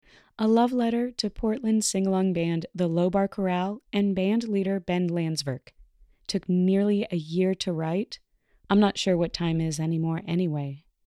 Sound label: Chorale